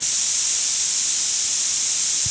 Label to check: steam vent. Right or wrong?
Right